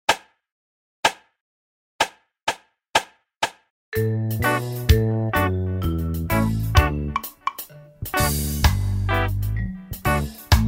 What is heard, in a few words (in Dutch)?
instrumentaal